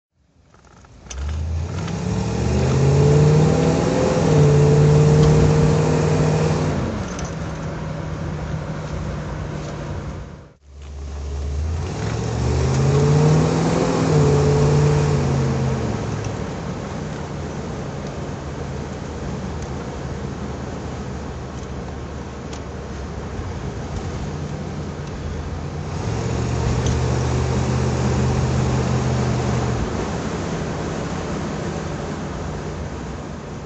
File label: Facel Vega Facel 2 drive